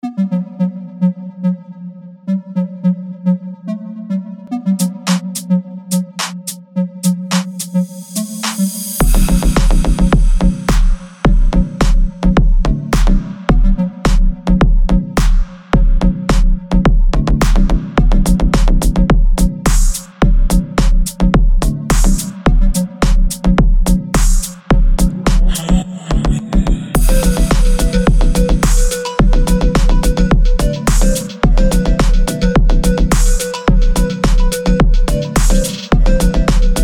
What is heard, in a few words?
ringtone low bass